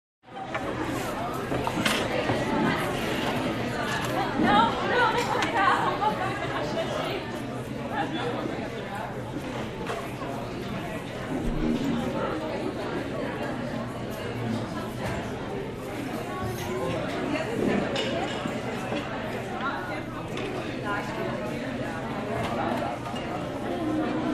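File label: zvuki zala kafe. razgovori zvon posudi, sounds of a cafe hall, conversations, clinking dishes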